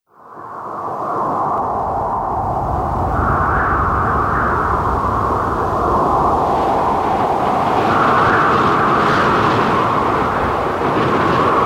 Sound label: Wind measure